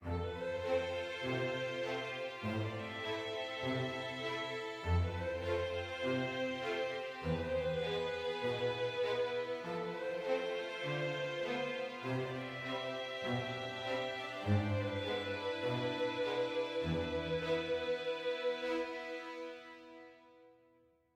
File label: adventure med; Quivr; PS4 Windows gamerip 2016